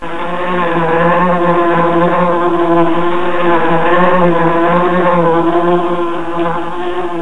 house fly

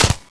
shot

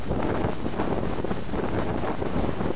para fly

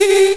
laser

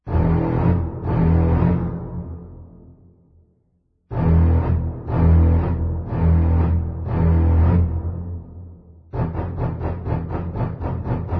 jaws

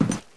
wood

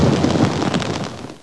lava

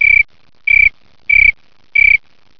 cricket loop single

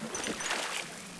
wade

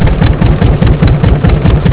tank motor

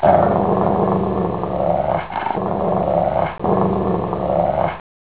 growl

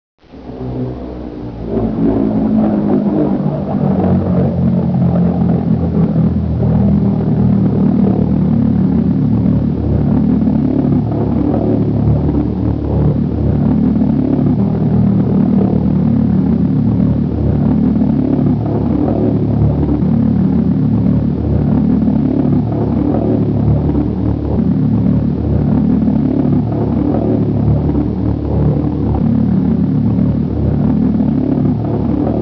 air raid; dod revolted steam

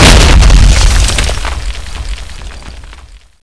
explode

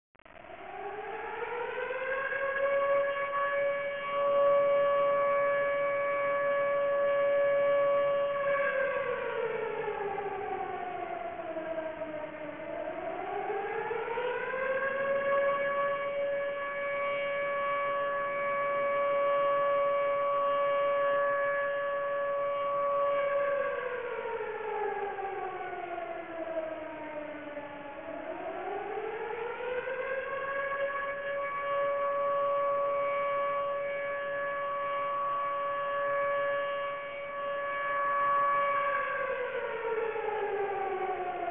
air, ambience

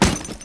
player ladder

